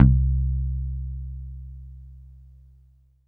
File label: BASS C3; East West - Phat & Phunky CD2; 90's Sample CDs